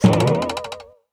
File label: tramp; Acrobat Bullseye